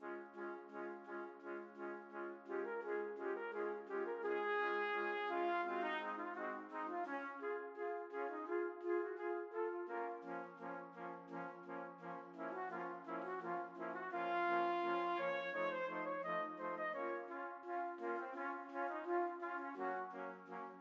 trumpet quartet

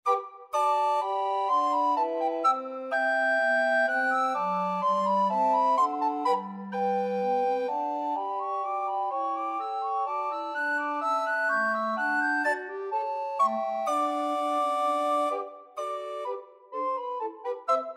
recorder quartet

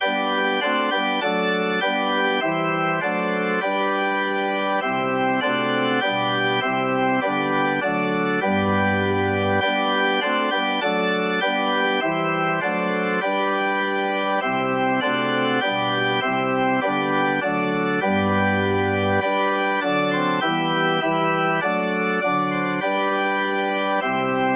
organ

